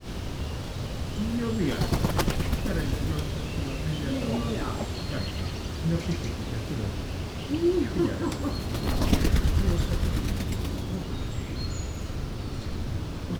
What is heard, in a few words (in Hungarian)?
repked szél, kookaburra kacagójancsi; allathangok; veszpremizoo 2013